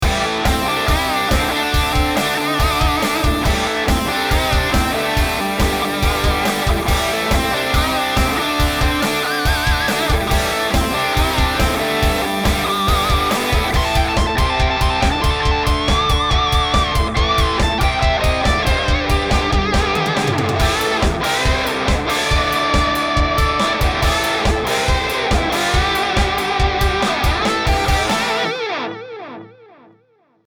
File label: Stratocaster Mid Gain; Mercury Box